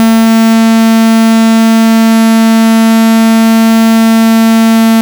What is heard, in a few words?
Beep Low 5 Seconds